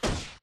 boost jet start; Titanfall 2

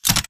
Mvmt FS Walk; AI Tick; Titanfall 2

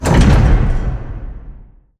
crane move stop clunk; Titanfall 2

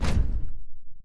atlas footstep sprint; Titanfall 2

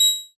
Radar Jammer Red Text Flash Beep; Boost Card; Titanfall 2

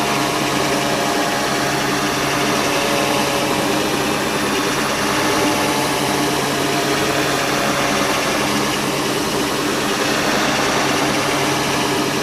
Worker Drone Hover; Titanfall 2

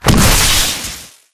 electrical death zap; Titanfall 2

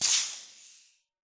boost jump start; Titanfall 2

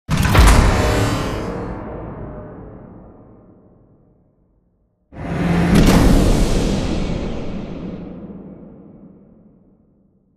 Giant Floor Panel Assemble Final Lift Mech; Boomtown; Sideways Town; Titanfall 2

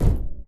Footstep Jog Far; Titanfall 2

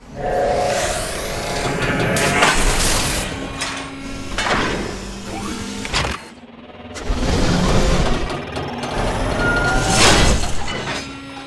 super atlas startup; Titanfall 2

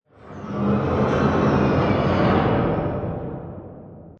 Assembly Plate Close Whoosh; Boomtown; Titanfall 2

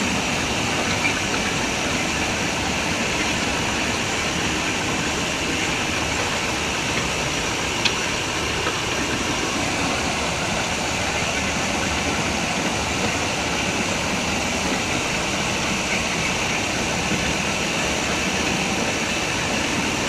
Prop Rack Small Move Dist, Warehouse; Titanfall 2; Boomtown

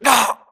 grunt death neck; Titanfall 2

